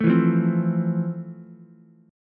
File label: F Major 7th F, Ukulele; strummed; JGuitar